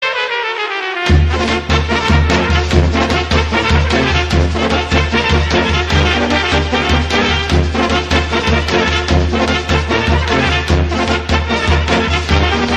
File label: Trubaci